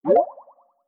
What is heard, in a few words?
message received